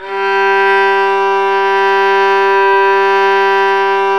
violin G 4; Violin 2&3vb; Vln2 % + dyn

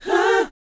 BOYZ, Vocal, R&B Dance; Spectrasonics Vocal Planet

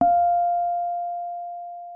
Rhodes MK1 F5; Xsample VOL-1

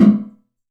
Tom Room, TR-606 Bent Samples; Sonic Foundry (Sony Creative Software); The Electronic Music Manuscript Collection; Battery 3 Sample Kits

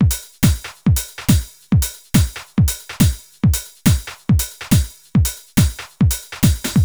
140 C trance kit, Drum & Perc Loops; Trance Explosion Vol1

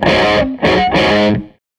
Tele Guitar Loop

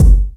BD, Yamaha RY-10, Drums

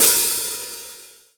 XV Hat O, Roland XV-5080

